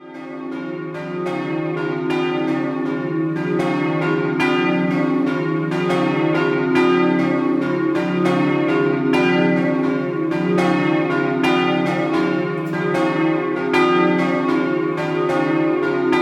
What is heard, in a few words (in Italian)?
POVOLETTO, campane